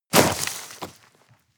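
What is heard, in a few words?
step gravel land hard, footsteps; schritte; menschen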